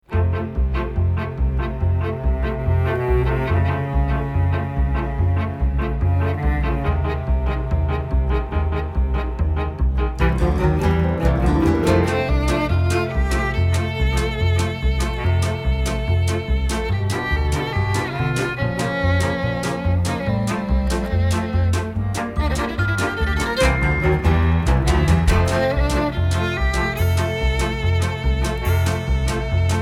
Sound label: Instrumental; Audition Cut